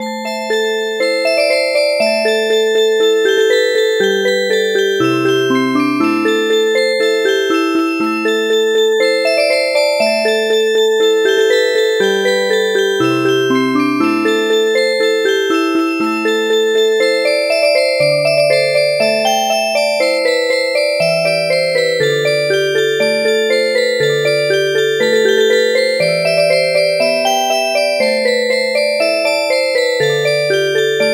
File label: Music Box